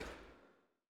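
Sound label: choir "P", Women; Sound & Vision - Gigapack I CD 2 (Roland)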